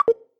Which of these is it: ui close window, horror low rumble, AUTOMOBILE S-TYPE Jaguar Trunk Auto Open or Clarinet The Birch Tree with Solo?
ui close window